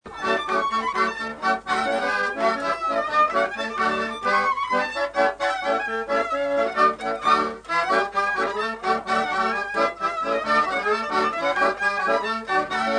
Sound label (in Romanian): Polca la taraf; jocuri si cantece batranesti; Vaslui